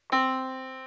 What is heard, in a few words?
yamaha Disk